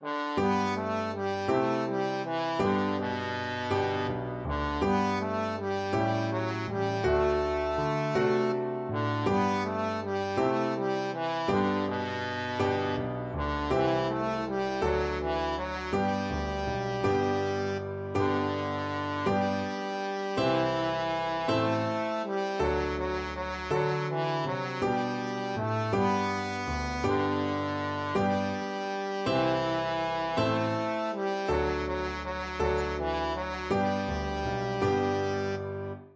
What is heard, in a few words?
my bonnie, trombone